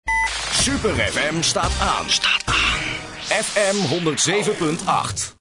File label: Jingle; Super FM; gijs zegers